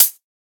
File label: soft hit normal